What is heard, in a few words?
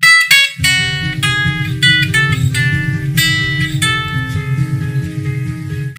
Nokia Ringtone